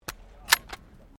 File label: RIFLE 303 MK1 EJECT CASING